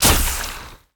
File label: arrow